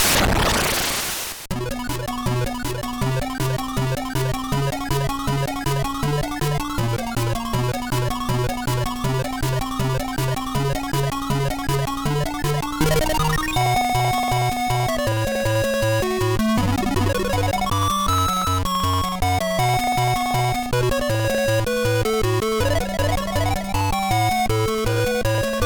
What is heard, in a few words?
Boss Battle; Lufia Ruins of Lore; gba; Ancient Cave Boss Battle